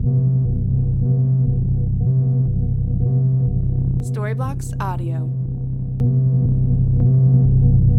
Ambient House Synth Bass 120BPM C

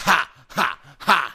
Rap Vox

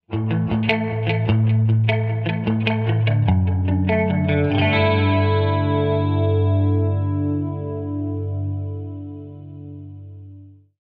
sitcom electric guitar scene change ident

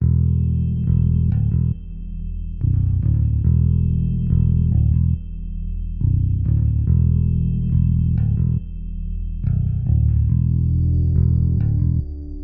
nightcrawlers underscore bass

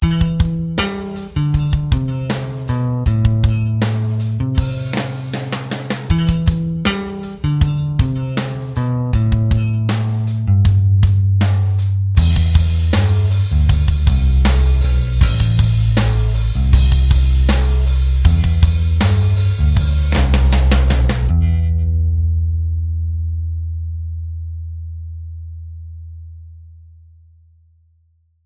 Bass and Drums Only 79bpm

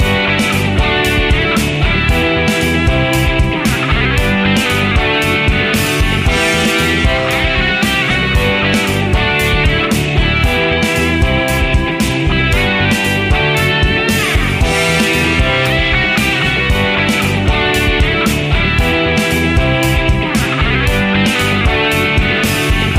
Motivational Upbeat Indie Rock

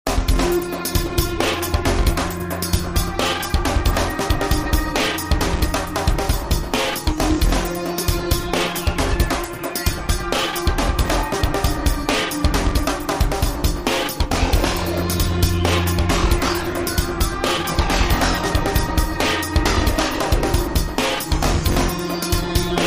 raw energy

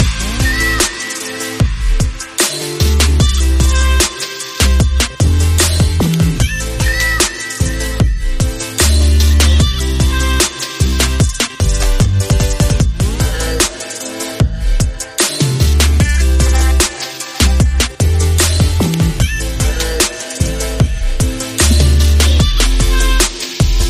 Island Beach House

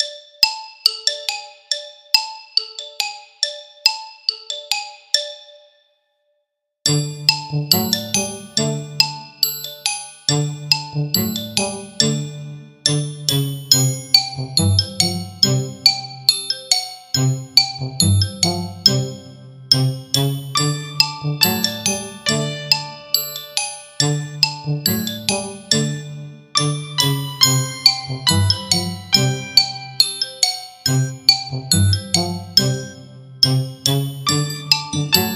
playful comedy underscore

